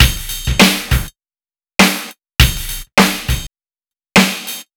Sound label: electronic, funky drum loops